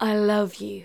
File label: Female vocals and robo-vox